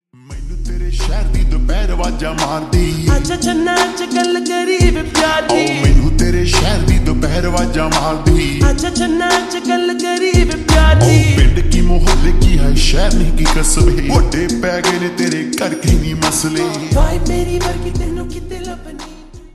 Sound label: Slowed Reverb Ringtone